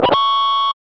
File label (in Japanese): 変な音, 謎の効果音です; かんきょう